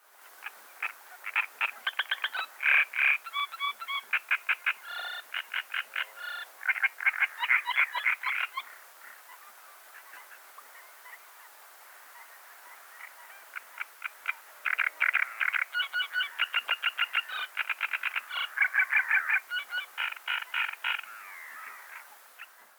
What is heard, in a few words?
Acrocephalus arundinaceus Rousserolle turdoïde